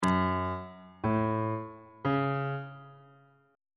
g flat minor triad chord on bass clef

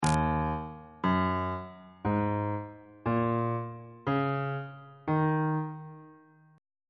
e flat minor pentatonic scale

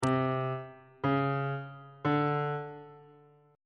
g flat 5th intervals

